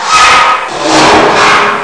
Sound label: metal scrape